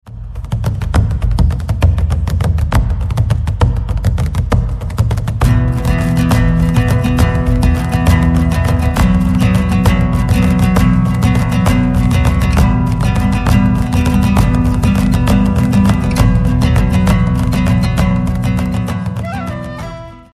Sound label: bodhran; bodhran en mode "tribal"